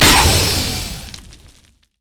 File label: power ball; robo rammus